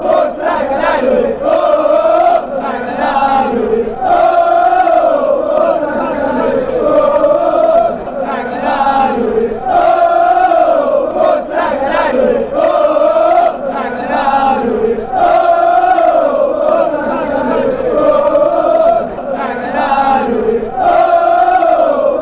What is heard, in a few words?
come on ole, chants; catania